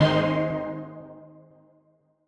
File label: Old Skool G#2; KG MegaSaur; M8